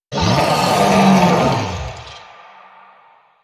MONSTER SMASH; zoochosis; lazynap; whalekiller deepsea monster

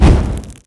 attack; skib dumpster warlock

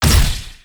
melee impacts; sprunki mr fun comp pack

